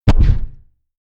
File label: silencer dry